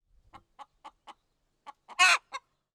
Animals Chicken

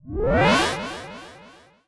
SFX Battle Buff Up; Era's Time Delivery Service

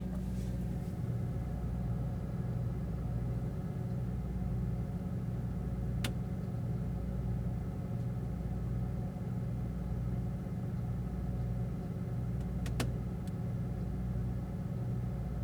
Ambiences Inside Refridgerator

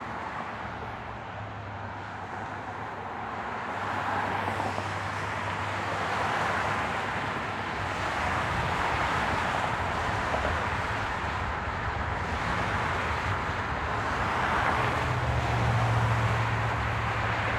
Traffic Above Highway

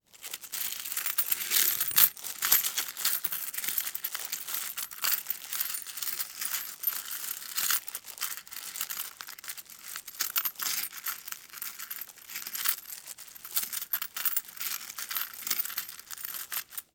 Coin Bag Moving

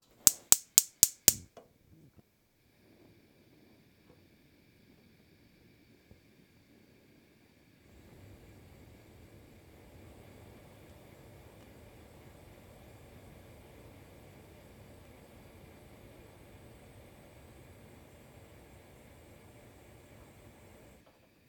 Fire and food Gas Slowly Turning On Stove

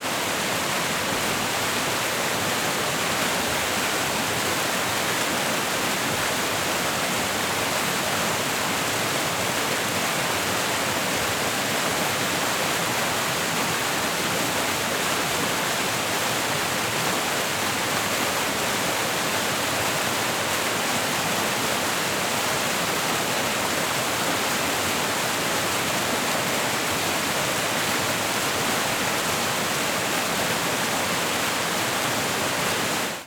Water Pleasant Hill Waterfall Fountain

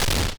Enemy Bullet Strong Impact; Eminent Domain

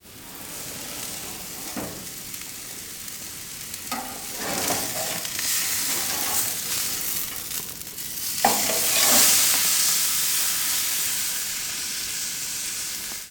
Fire and food Moving Steak On Grill

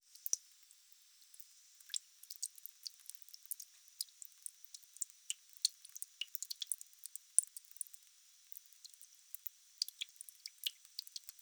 Dripping Bathroom Sink; Water Drip

